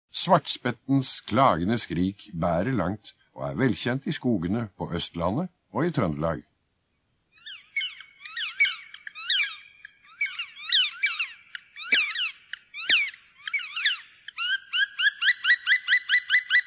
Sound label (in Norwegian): svartspett